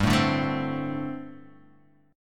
G Suspended 2nd Suspended 4th, Guitar Half Step Down